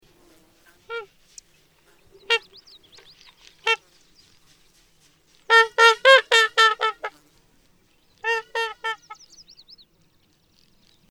Trumpeter Swan, Cygnus buccinator, waterfowl

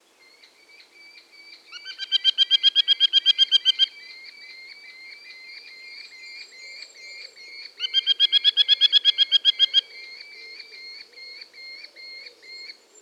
Merlin, Falco columbarius; raptors

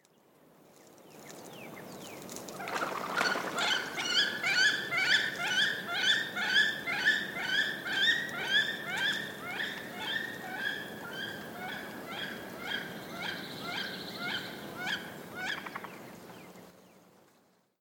Wood Duck Aix sponsa; waterfowl

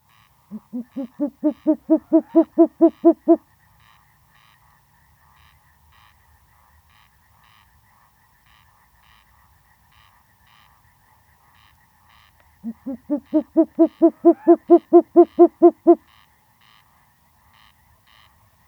Short-eared Owl, Asio flammeus; owls

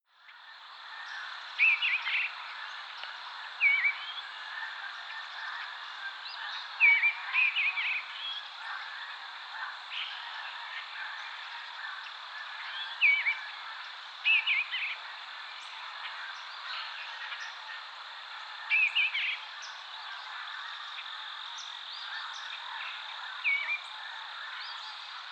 Eastern Bluebird Sialia sialis; thrushes thrashers

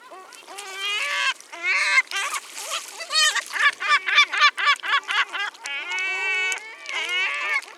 Lesser Black-backed Gull, Larus fuscus; gulls terns

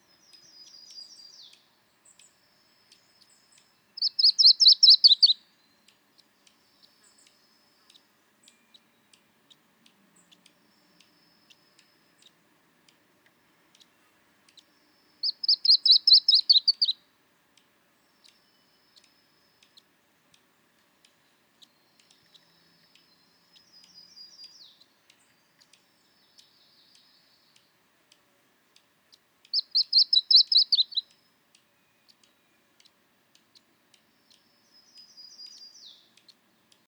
Yellow-rumped Warbler Setophaga coronata

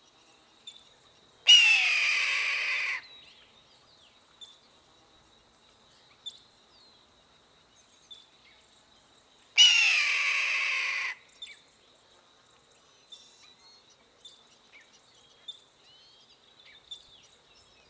Red-tailed Hawk, Buteo jamaicensis; raptors

